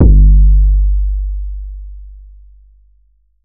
808 one shot G; Slate Digital BASSHOLE Sample Pack

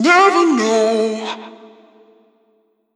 Vocal Sample Pack Phrases Am; Slate Digital Omnivox Vocal Sample Pack

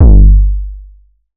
808 one shot G; Slate Digital BASSHOLE Sample Pack

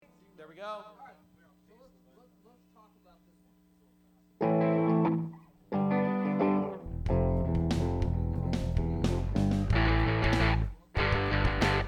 Strings Mix

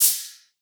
perc brushed, Hip-Hop Drum One Shots; SD CAICOS Hip-Hop Sample Pack; Slate

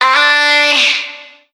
Vocal one shot D

